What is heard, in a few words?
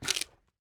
Raptor Bite Sounds